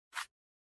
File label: Cell Phone Hardware Swipe